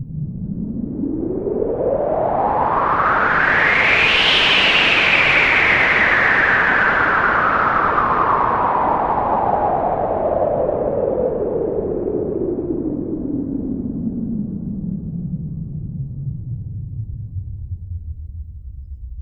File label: Ensoniq ESQ-1 Wind